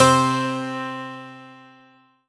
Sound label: Kurzweil K2000 Quillsichord C3